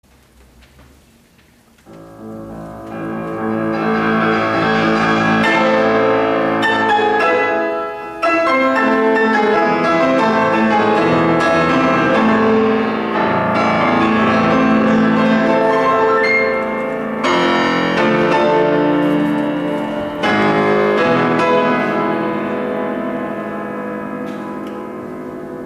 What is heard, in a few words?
Koncert Lya minor, 1 chast; E. Grig